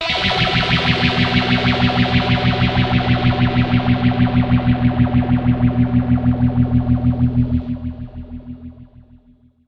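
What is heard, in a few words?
dirty guitar stereo polyphase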